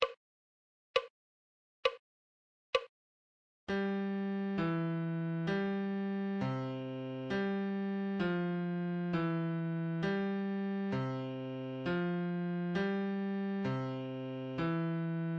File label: musical reading in bass clef Adagio